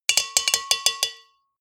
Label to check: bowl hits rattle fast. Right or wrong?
Right